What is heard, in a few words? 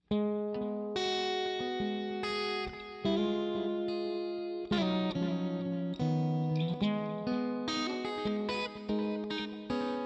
jc bridge middle, pickups